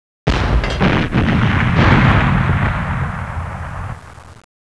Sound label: G launcher